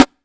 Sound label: Nice Snare Sample C Key, acoustic snare sample tuned to the C note